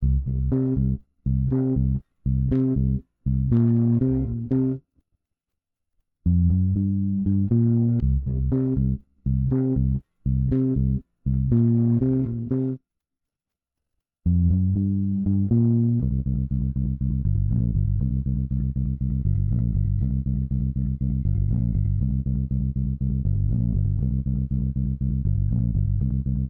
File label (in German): nur Bass; Steinberg SpectraLayers Pro 10 Demo